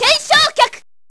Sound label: rise bird kick